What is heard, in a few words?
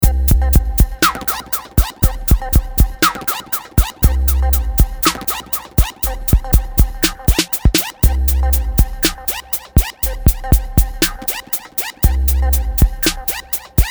Smoked Out Looper 120bpm